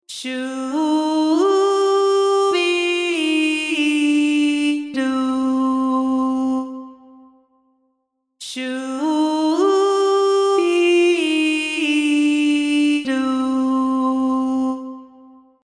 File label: vowel legato